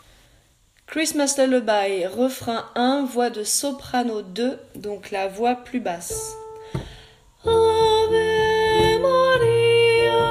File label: Christmas Soprano 2 Refrain 1